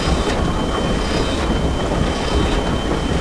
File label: Ironclad Run Loop; Civ4 warlords